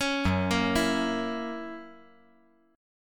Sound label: F sharp 7th